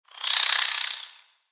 Spider Sleep; creatures mechanical; Lego

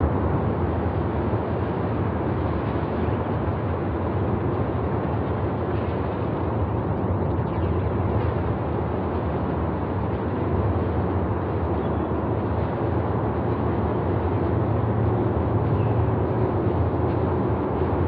Amb 2D Gateroom; LU; ambience ninjago

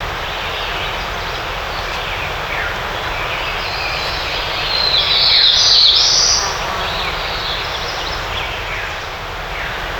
Birds; aronwk collection; ndaudio; ambience pet rock

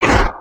Run, creatures organic

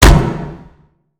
Robot Get Hit Dry; creatures mechanical; Lego

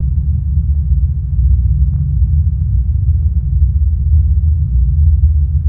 cave amb; ambience avant gardens

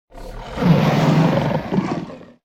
roar, emotes

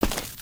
Pet Warthog Dig; creatures pets

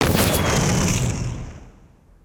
ship explosion; destructibles

